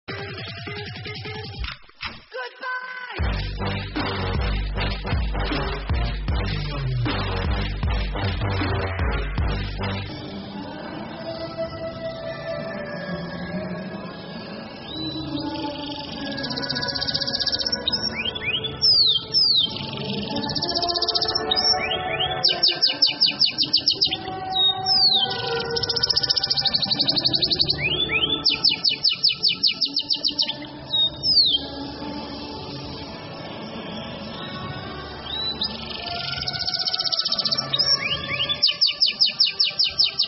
CANÁRIO DO REINO

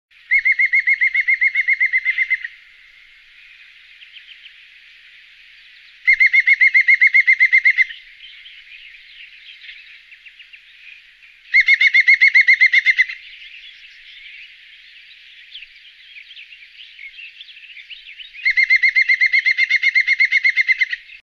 canto do pica pau verde

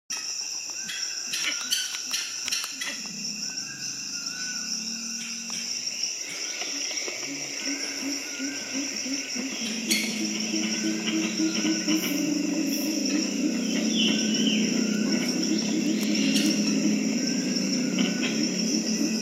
Canto da jacamim de costas brancas

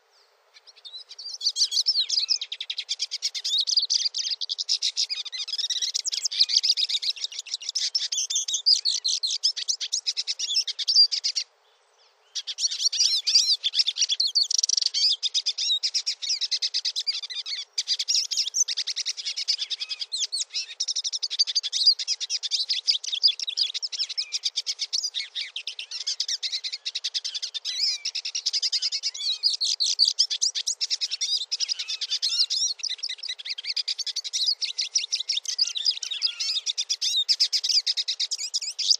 canto do pintassilgo

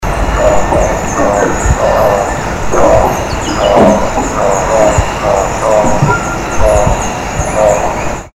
canto da saripoca

